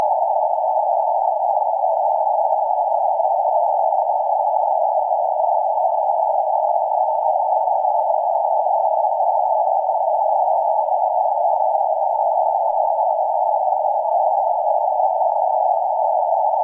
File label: CW 750Hz random noise; CW V at 12wpm at -8dB/300Hz = -3dB/100Hz = -17dB/2500Hz = +3dB in 1 bin; Bypass